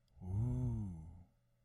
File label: good ooo; angband